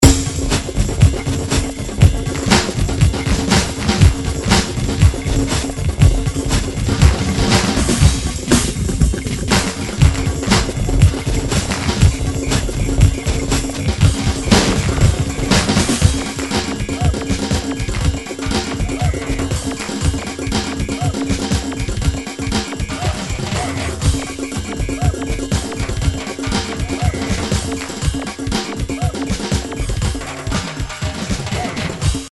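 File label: drums drum pattern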